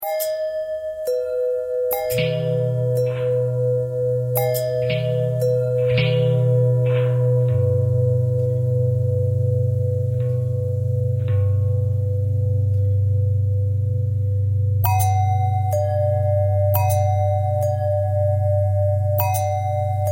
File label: long bell thread bend thread; bells